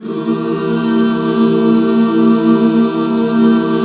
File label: C 7, back chords; Sound & Vision - Gigapack II CD 1